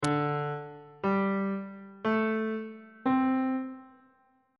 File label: d dominant 7th suspended 4th chord intervals; bass clef